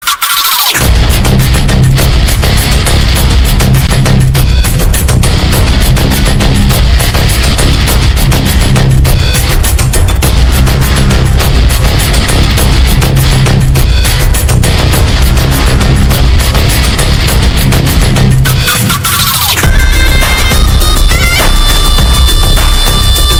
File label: Bgm ringtone villain BGM; Vikram; Rolex